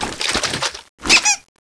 elephant reload; zmall

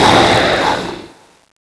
guard end, scorpion

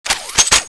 wing gun ex clip in out; zmall; zm boost; privilege; mboss

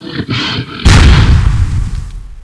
revenant ready

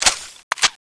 winggunex wingstart; zmall; zm boost; privilege; mboss

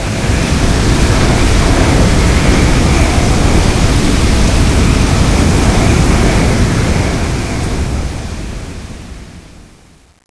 windstorm

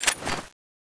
rocketpistol draw; zmall; zm boost; privilege; mboss

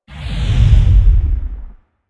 turret mode change; zmall

